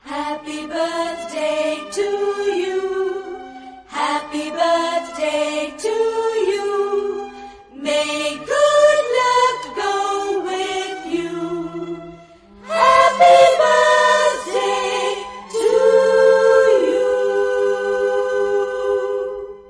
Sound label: Traditional Happy Birthday To You Song